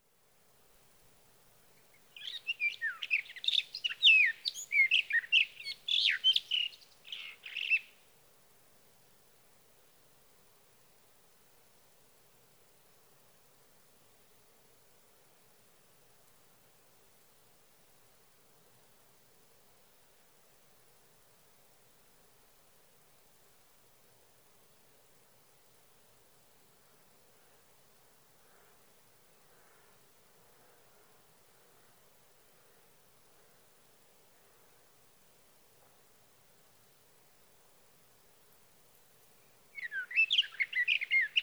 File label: Sage Thrasher